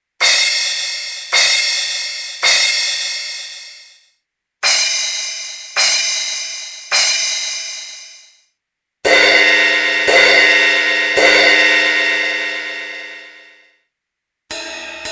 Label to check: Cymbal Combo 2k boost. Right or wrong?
Right